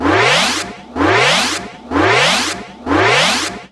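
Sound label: synth whoosh, House FX oneshots; E Lab; XTc Files of House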